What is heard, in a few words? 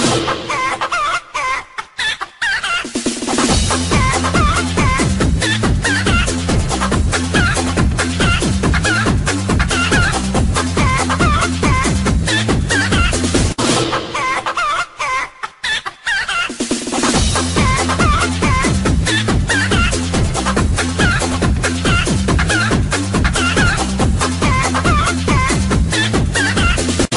Chicken song